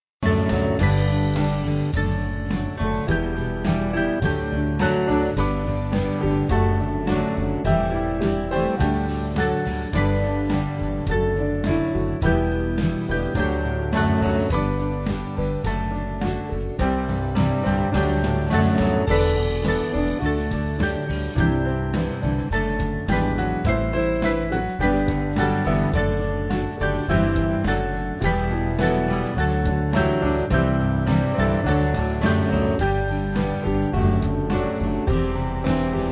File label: Band